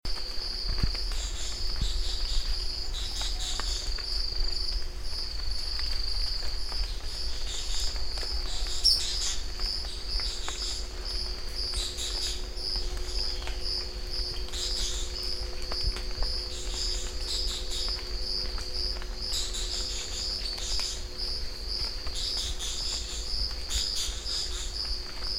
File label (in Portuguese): canto do dançador de cauda graduada